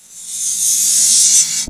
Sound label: METAL BRUSH, Disjointed Textures; USB Soundscan vol.33; AKAI; BLASTER